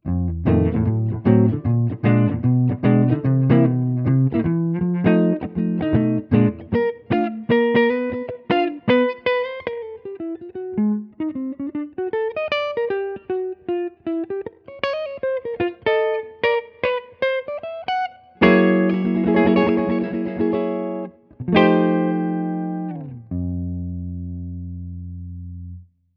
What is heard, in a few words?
Guitar